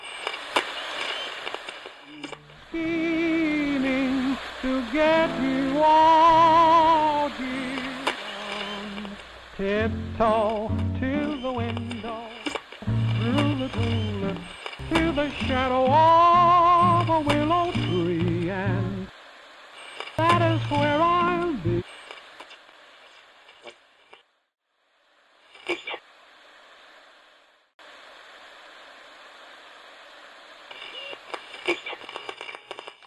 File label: ghost radio; tiptoe; winchester